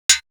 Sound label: Perc; AMG; Perc One Shots